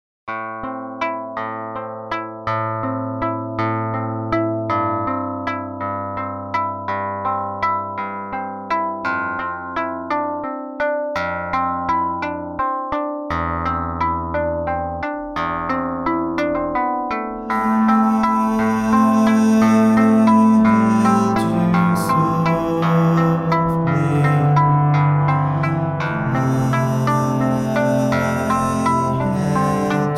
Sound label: solo on a string